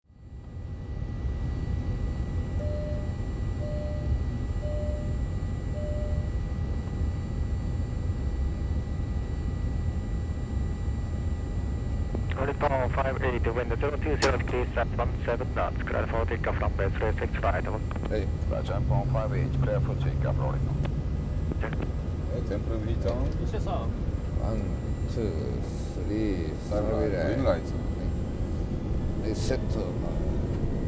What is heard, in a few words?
L1011